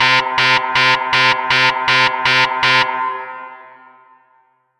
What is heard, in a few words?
klaxon